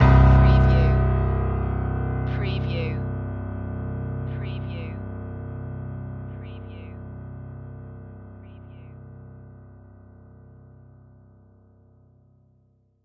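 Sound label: Production Element Piano Stab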